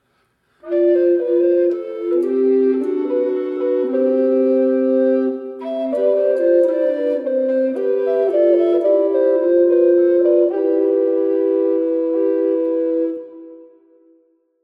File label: Trio; Machaut